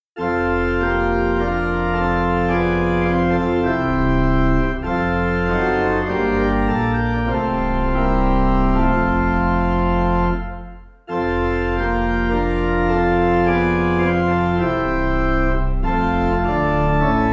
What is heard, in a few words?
Pipe; Seymour; Depth Of Mercy